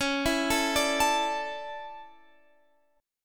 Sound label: D Major 7th Suspended 2nd